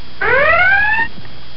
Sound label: general quarters; OnTheBall